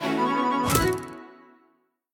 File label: free Spin Animation; robin hood